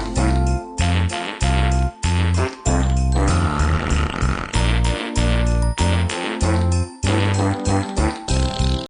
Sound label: Jingle Bell's Funny Mix Ringtone